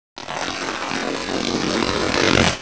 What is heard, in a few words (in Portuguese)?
rasgo